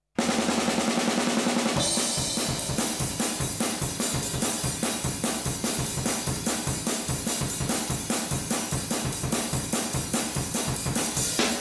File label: drums